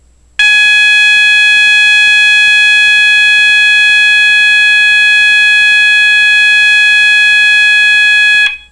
800hz

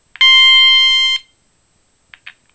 Single Stroke Bell; Fire Alarms

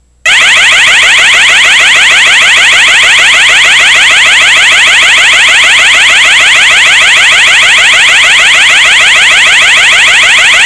Fast Warble

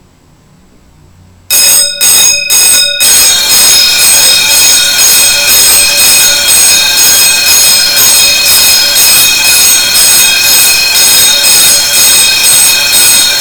Fire Mix; simulation of the HS Simplex system activating with the Edwards system

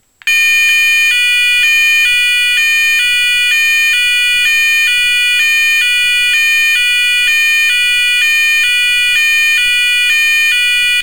Hi Lo; Fire Alarms

